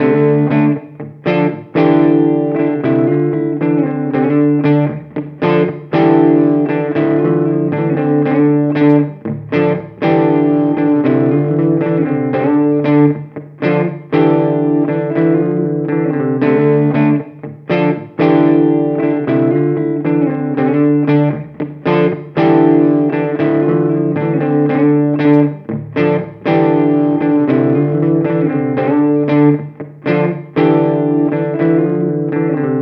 Torpedo CAB M 1 Blues Amp Preamp

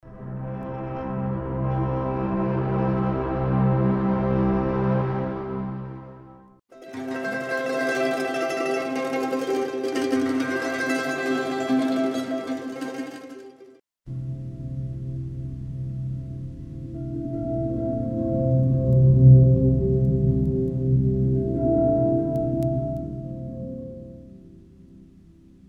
motions italian lowlands layer einzeln; NI arkhis

